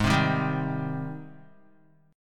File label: Ab Major 7th Ab down Guitar 4F 4A 5C 5G 5C 6E; AbM7 strummed; JGuitar